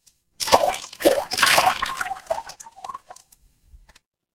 water splash

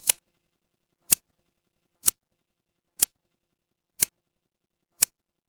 mechanical switch

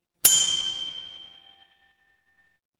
instrument bell

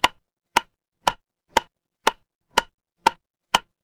instrument wood block

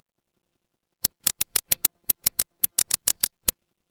office pen

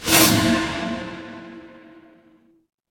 magic scifi portal

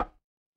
impact wood knock

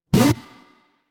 retro game combo

